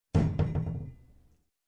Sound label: Metal Door bump sound